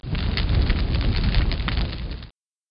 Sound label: burning, weapon